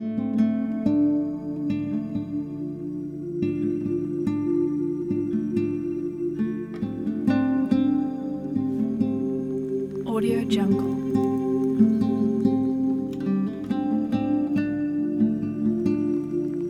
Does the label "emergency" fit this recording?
No